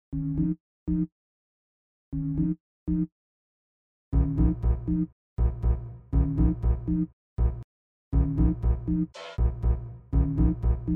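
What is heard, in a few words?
sine wave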